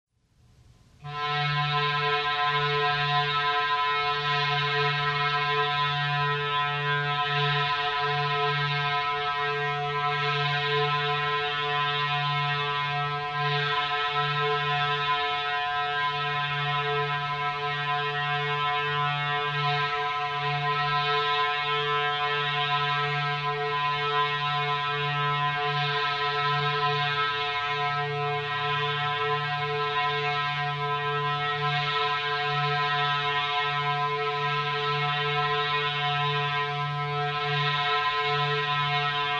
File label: Square Wave Walk